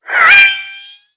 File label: whizz